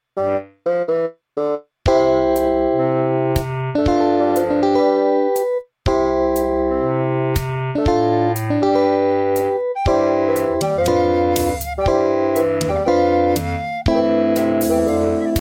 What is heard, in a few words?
karaoke; rock cycle; science